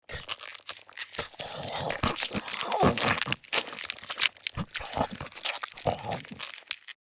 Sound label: Fantasy creature eating flesh